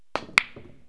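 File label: break, 9ball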